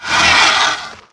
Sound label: facehugger ouch